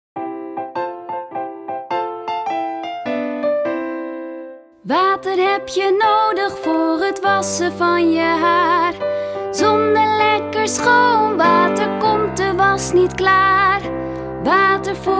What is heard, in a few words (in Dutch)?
Water heb je nodig begeleiding met zang; taalsprong